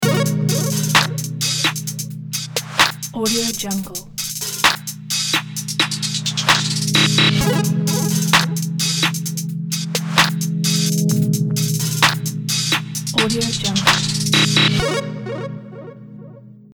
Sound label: makeup ident